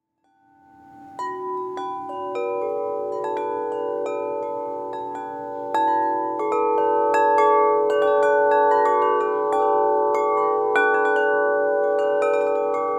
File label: Chimes; SonicSoundWaves